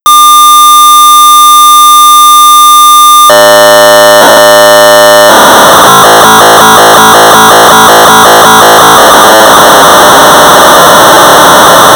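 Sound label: BASIC Sorcerer 1200 baud; Character Programmer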